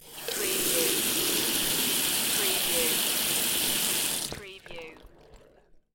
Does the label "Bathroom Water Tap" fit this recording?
Yes